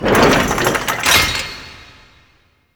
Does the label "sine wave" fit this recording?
No